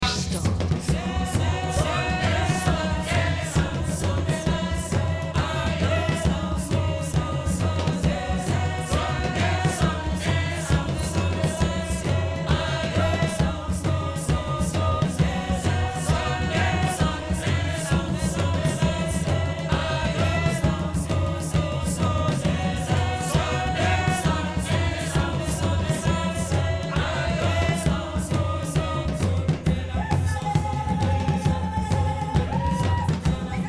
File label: Choir